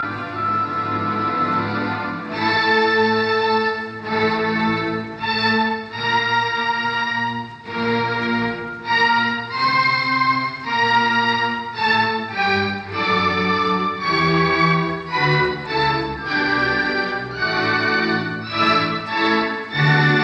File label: Violin Concerto in D major Allegro non troppo Cadenza Tempo I; Brahms; Op 77; Joseph Szigeti